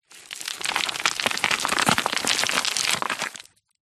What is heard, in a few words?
foley stones rocks small landslide fall down; zapsplat